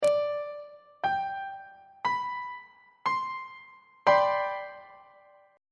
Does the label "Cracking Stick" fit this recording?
No